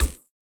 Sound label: ball bounce shock; CrossCode; battle